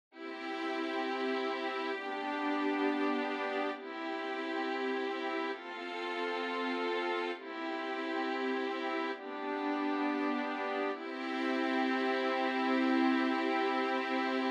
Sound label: strings